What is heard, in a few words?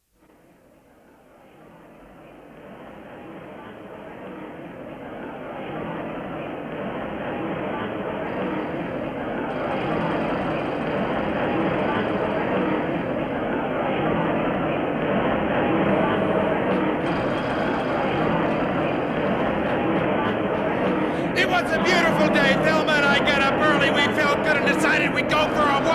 screaming mad